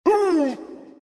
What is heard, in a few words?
pain; invoker